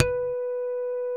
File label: HARMONIX B4